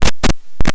KNISTER, NOISE; Xsample VOL-9

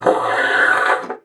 PUSTE, bassoon; Xsample VOL-6

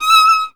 GEIGE; Best Service; Alpine Volksmusik

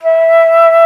FLOTE, Alpine Volksmusik; Best Service

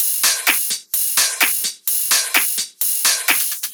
Drum Loop 128BPM FULL ohne BD

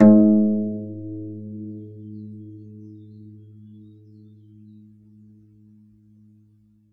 HAKENHARFE; SoundBytes; Early Patches

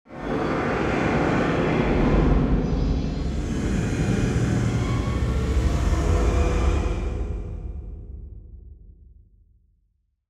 MASCHI; Best Service; Advanced Mega Effects

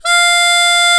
MUNDHARMO; Alpine Volksmusik

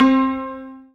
ZIMBAL C 3; Best Service; Ethnic Journey Sound Cube

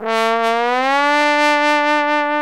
POSAUNE; Alpine Volksmusik

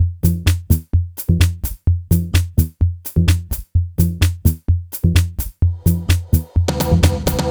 Drum Loop 128BPM FULL ohne BD

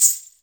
SCHELLEN; Best Service Advanced Media Trax Modern Composer

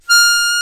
MUNDHARMO; Alpine Volksmusik

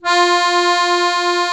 AKK VIOLIN, akkordeon; Best Service; Alpine Volksmusik

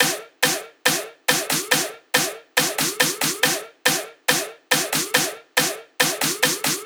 drum Loop 140BPM Full ohne BD; Vengeance - Essential Clubsounds Vol.5; VEC5 Drumloops; VEC5 Multi Loops 140BPM

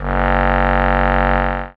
bsc fs1, MIRAGE Bass Klarinette; Retro Sampler

